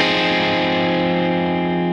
8 9 8 10 8 Fm7

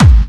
Hard kick

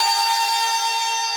synth main

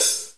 hat close; osu

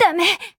combo break; osu

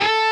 Bend full

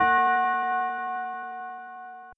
bell B4

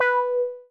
meow B

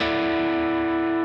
N.H.

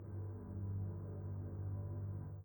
chord

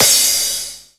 drum hit finish

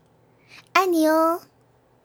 applause; osu

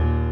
piano low

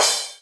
soft hit finish; osu

